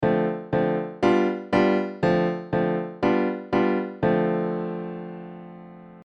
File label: Dorian prog; Modal Harmony: An In-Depth How-To Guide